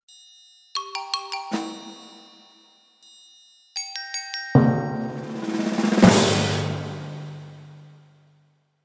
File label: perc curious